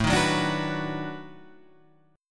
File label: B 7th Sharp 9th, guitar